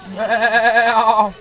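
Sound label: male voice bleating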